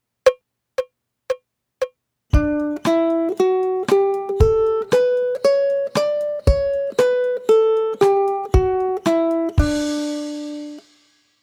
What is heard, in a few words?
d major scale